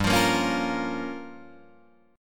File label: G Minor 7th Flat 5th, Guitar Drop D